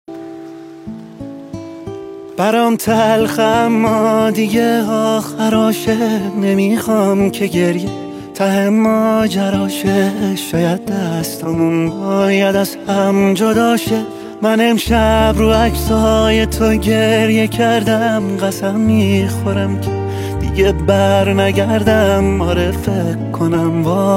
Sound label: Waltz